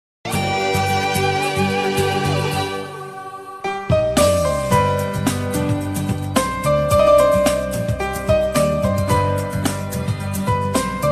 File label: music ringtone bgm ringtone hindi ringtone